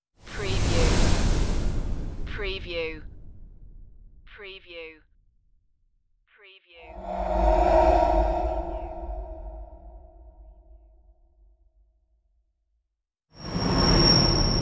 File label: space whooshes